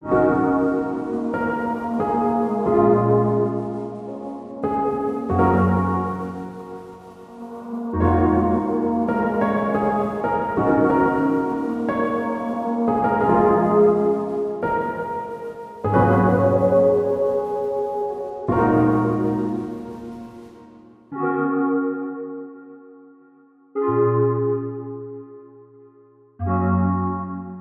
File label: Melody 91bpm Ebm